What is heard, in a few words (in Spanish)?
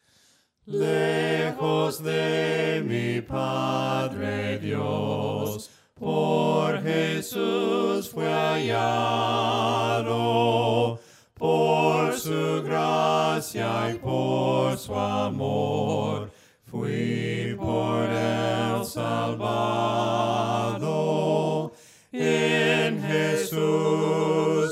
melodia palabras